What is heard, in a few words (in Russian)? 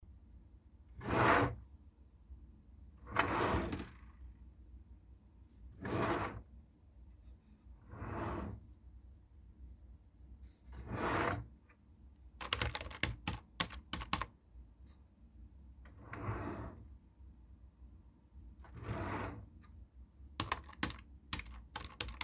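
выдвижная полочка для клавиатуры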